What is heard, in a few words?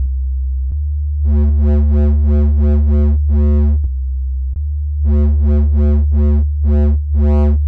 Digital Clip